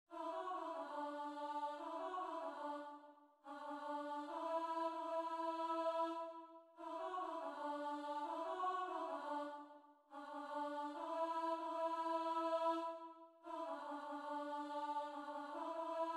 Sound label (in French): Alto; L oiseau